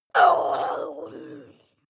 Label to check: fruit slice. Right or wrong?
Wrong